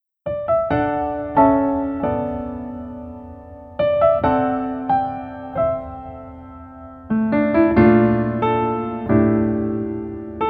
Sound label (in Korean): Piano ver, 멜로디MR